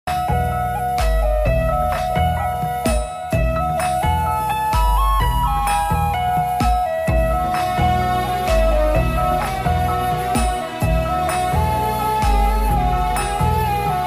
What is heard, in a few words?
instrumental version song ringtone